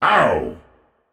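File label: non verbal ouch, voice; cernunnos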